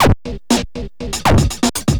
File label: Old School Break, Drums